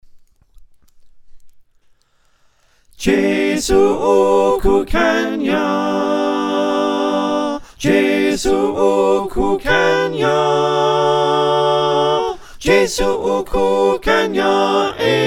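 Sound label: soprano alto tenor bass without call, choir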